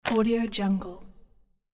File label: wood tear crunch; hollywoodedge